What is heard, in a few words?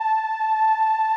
SOLINA, String Machines